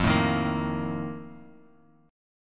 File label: F 11th F, down, chord; F11 strummed